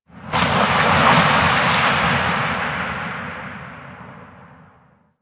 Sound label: Ship Exterior Thunder Claps; Ambience; Warframe; Corpus Ship Remaster